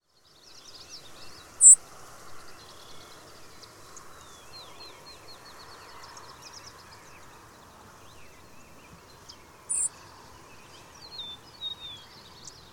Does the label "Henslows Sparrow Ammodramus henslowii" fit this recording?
Yes